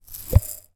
dehumidifying egg remove rubber seal cap

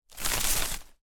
hand bash ruffle plant leaves

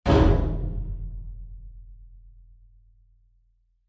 impacts metal medium hit resonate lightly rattle

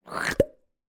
cartoon squelch pop; zapsplat

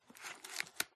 book open paper; PMSFX

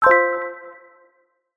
multimedia notification mallet synth dreamy

